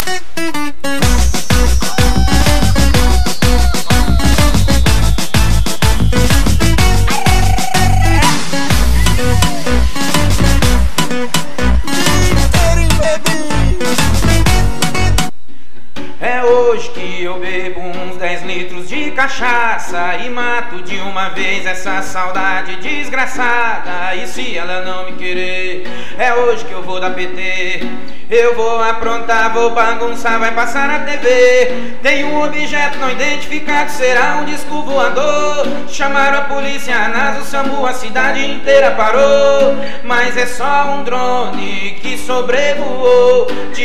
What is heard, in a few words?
drone